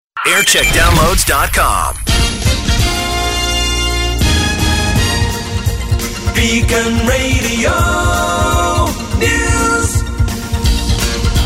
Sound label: Jingle Montage; Beacon Shropshire